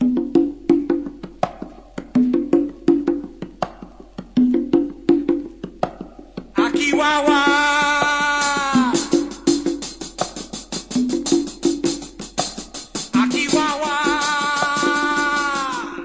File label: gonga n vox; MELODIC LOOPS; SAMPLED MUSIC LOOPS